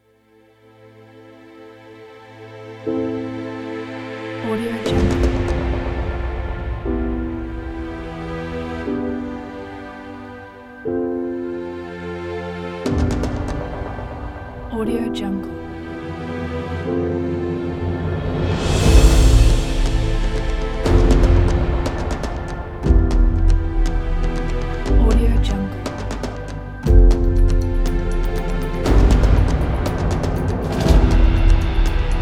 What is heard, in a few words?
inspiration motivate cinematic epic pack